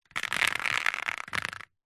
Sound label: nature water drips short sudden onto glossy paper bag